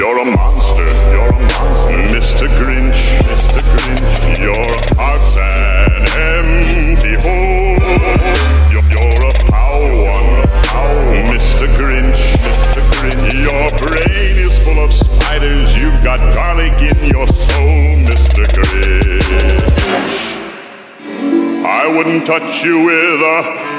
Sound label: Trap; Grinch